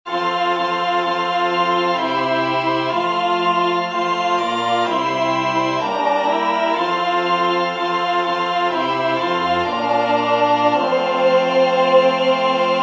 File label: Triple Alleluia alto; singing divine service iii in parts